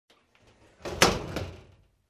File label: Sliding Door close